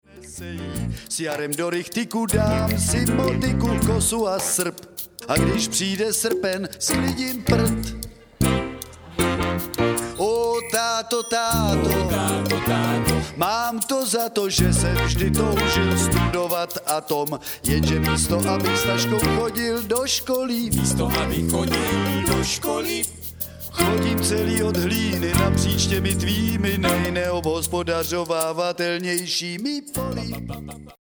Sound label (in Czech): rumba